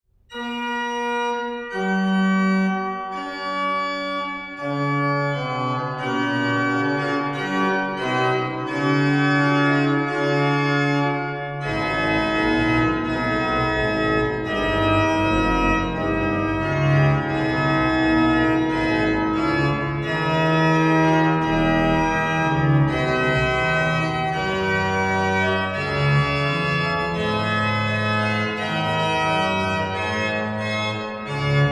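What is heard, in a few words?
praeludium, organist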